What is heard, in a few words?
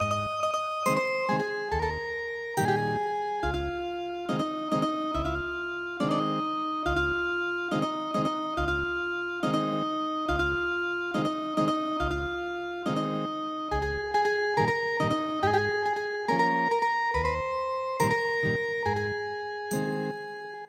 Ethnic Guitar; The Eskimo Hunter